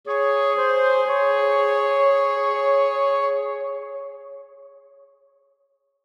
Woodwinds Light Swell Melody; Musical; Blastwave

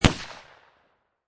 Pistol Rhuger 357 Single Shot Exterior At 45'; Gun; at 45 feet distance; Pistol Sound Effects

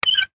Squeak Metal Hinge, Squeak Metal Hinge Lever; Foley; Blastwave

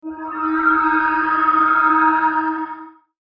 Logo Spacey Swell; Sonic Logo; Blastwave

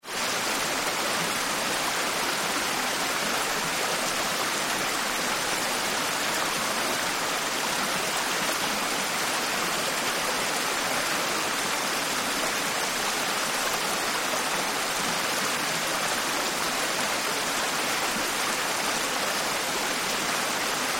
Stream Wide and Fast; Rivers; Nature

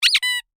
Squeaky Toy Single; Foley; Miscellaneous Toy Sound Effects; SoundEffects-BlastWave

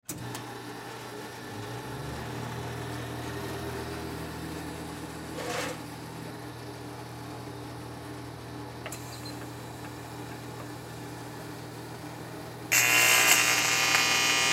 Tig Welder On Run Off Generator Sparks; Welders; Machines; Industry

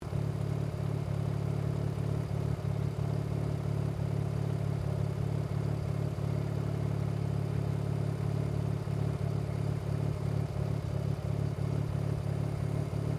CAR MAZDA RX7 SERIES3 EXTERIOR BONNET IDLE LOOP; 1983

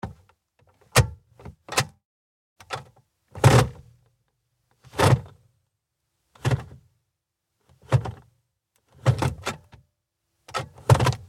Car Highlander Gear Shift Switch Moves; 2005 Toyota Highlander V6; Cars; Vehicles

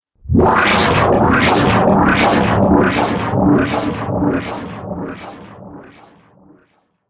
Science Fiction Laser Swamp Beam; Laser Sound Effect; Sci-Fi Laser Sound Effect

